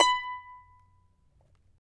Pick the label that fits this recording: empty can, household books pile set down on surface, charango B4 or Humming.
charango B4